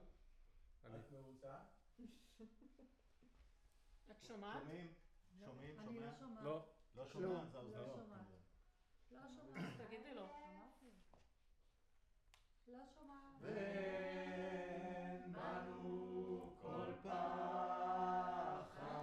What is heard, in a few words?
Choir